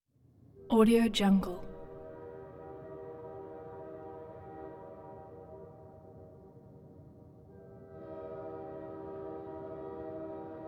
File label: train pass by